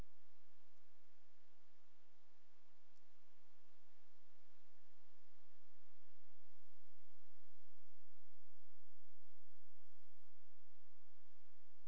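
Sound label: hydrophone; ICLISTENHF; 16000Hz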